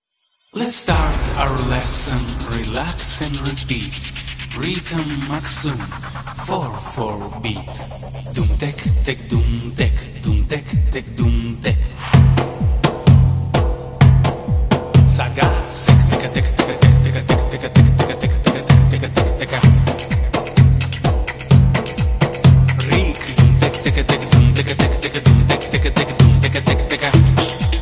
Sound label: drum solo mini kids